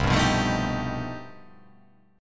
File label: C Minor Major 13th, chord; down; chord shape